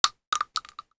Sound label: shell; weapons